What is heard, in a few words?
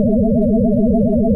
Sci-fi Hover Bot Loop; SFX Kit; Sci-Fi Loops